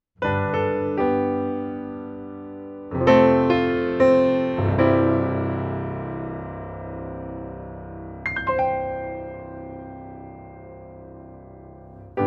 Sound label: Steinway D DRY